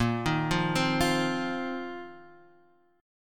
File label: Bb Suspended 2nd Suspended 4th, Guitar Standard; arpeggiated